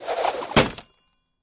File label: shuriken